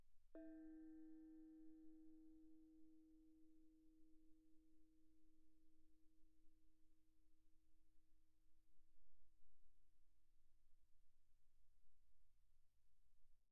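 thai gong Eb4 pianissimo, Percussion gongs & tamtams; thaigongs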